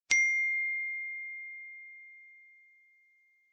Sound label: I phone ringtone